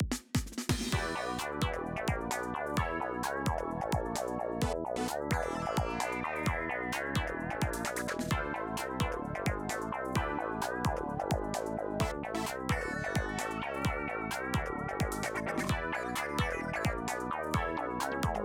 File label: BGM Minigame Item select